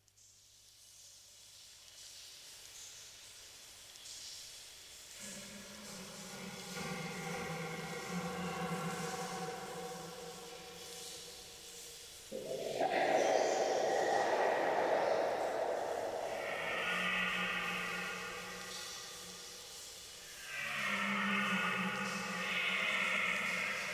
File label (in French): ambiance rain